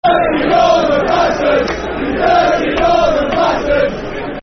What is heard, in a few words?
Football Chant (Soccer Song); Dirty Northern Bastards